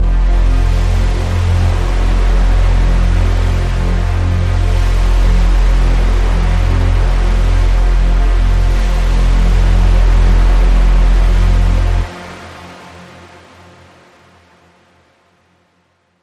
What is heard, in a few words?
Dystopian Pad